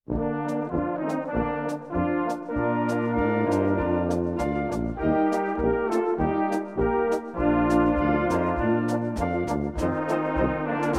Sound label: Metolicka Polka